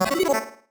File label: marimba dice; ゆめ2っき